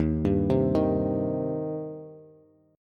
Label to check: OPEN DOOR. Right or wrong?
Wrong